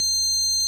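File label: ORG Vox G, Continental organ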